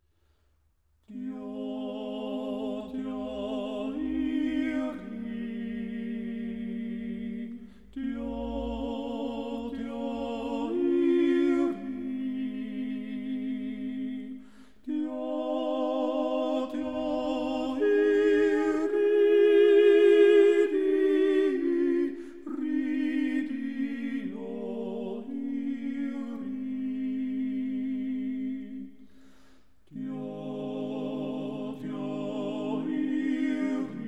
Andachtsjodler

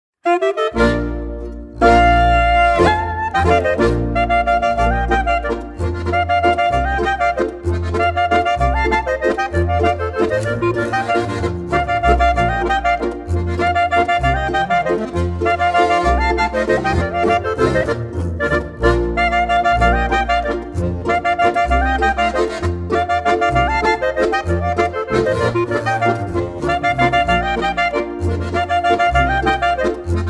Absamer Matschgerer Landler

